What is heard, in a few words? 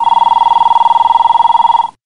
telephone